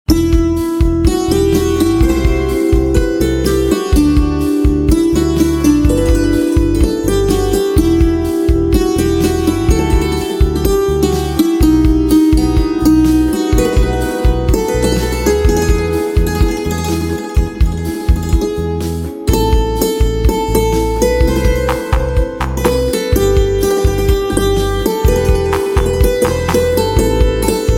Santoor, instrumental

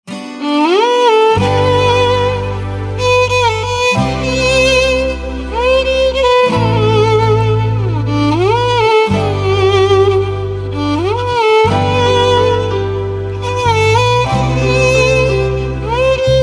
Violin

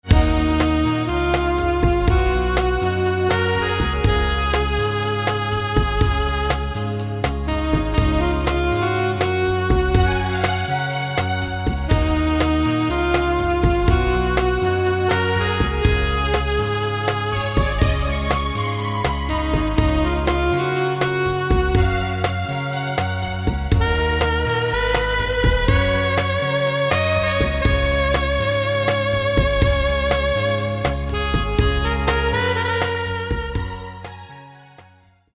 Saxophone

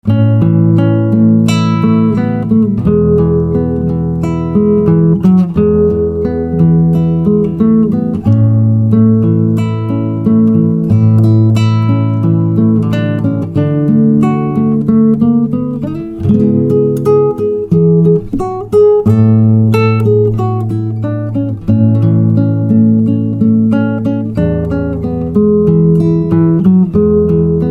Guitar, instrumental ringtones

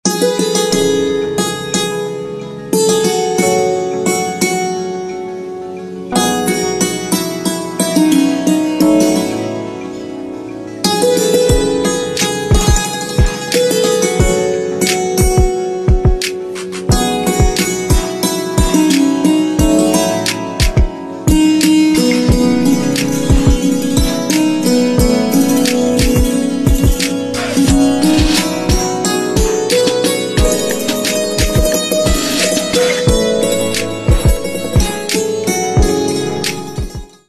Santoor Instrumental Ringtone

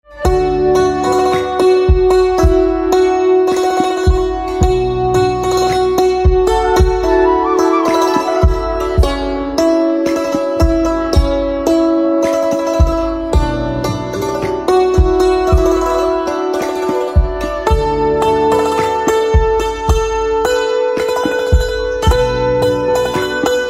Santoor; Vakratunda Mahakaya Suryakoti Samaprabha; traditonal devotional instrumental ringtones

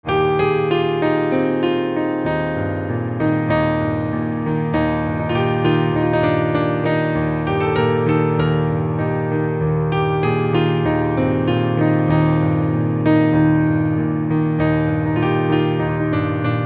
Piano Silent

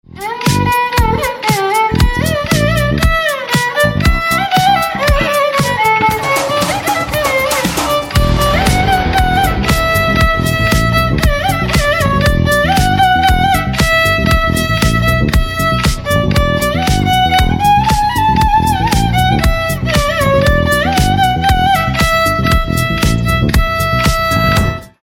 Violin

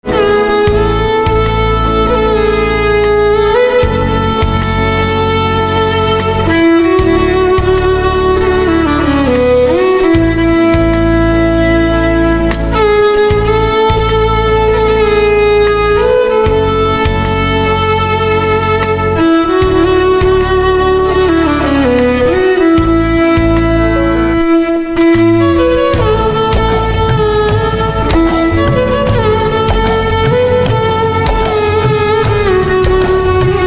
Violin

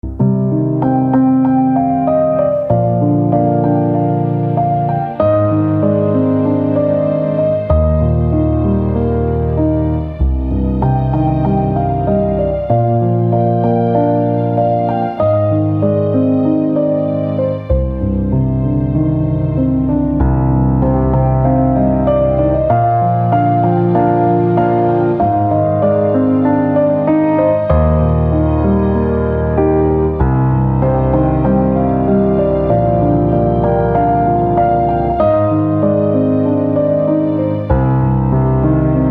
Piano

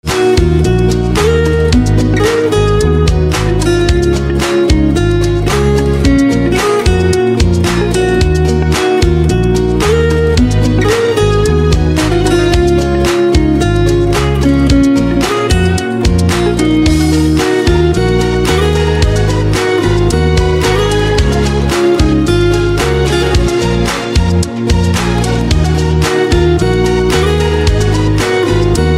Guitar

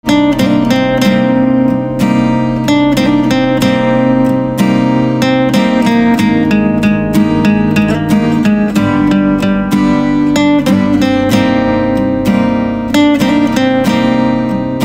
Guitar

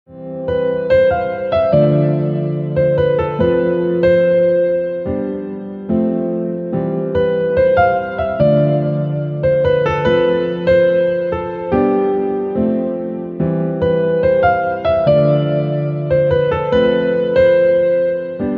Piano

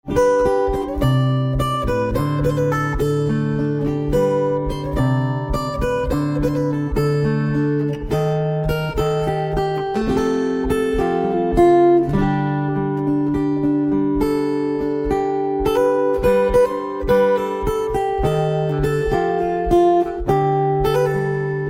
Guitar

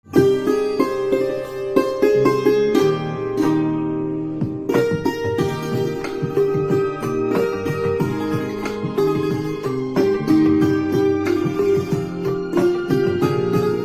Santoor, instrumental ringtones

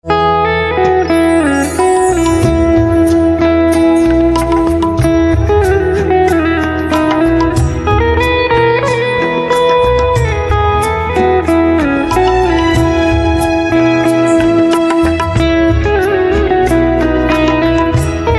Veena

